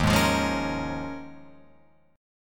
Eb 7th Suspended 2nd Suspended 4th Eb, Guitar Open F; strummed; 3,3,5,3,4,5